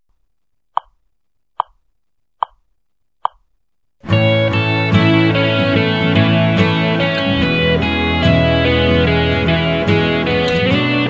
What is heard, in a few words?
arpeggio chord changes; fretjam